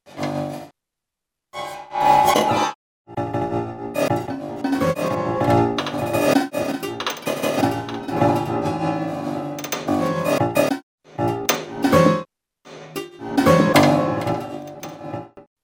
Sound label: Prepared Piano Piece VI